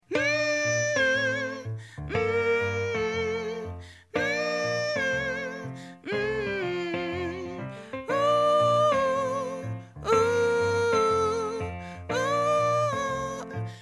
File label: tenor